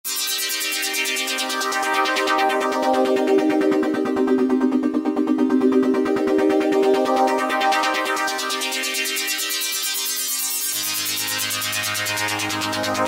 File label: Flap & Sweep, Korg microKORG; Factory Voices